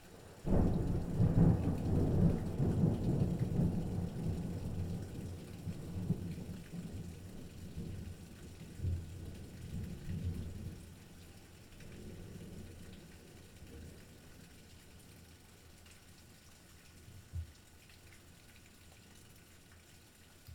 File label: thunder